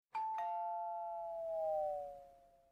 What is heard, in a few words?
doppler shifted ringtones iphone